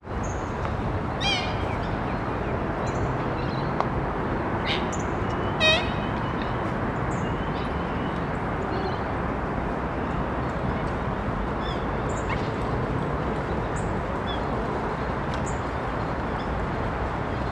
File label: lake across bbq bridge; Coburg Lake 2026